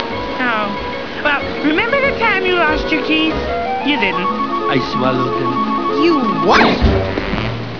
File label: keys